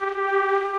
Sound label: Broken String Trumpet G3